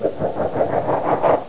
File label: AMB; dosgames; abuse